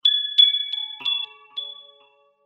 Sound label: wake up tones; oringz pack nine